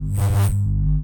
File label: accent sound design electric buzz resonant frayed buzz insistent medium fast; sonniss gdc